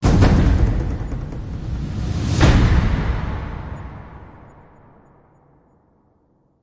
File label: Hit Big Hit with Roll; Brand X Music; Brand X Music Catalogue - Editor's Essentials